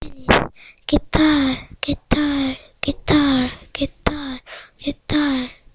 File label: guitar